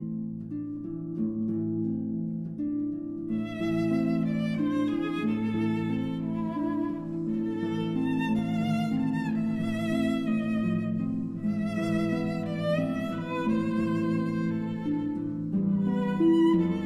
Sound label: Berceuse, for violin and harp